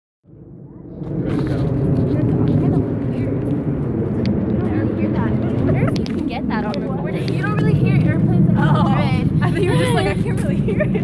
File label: plane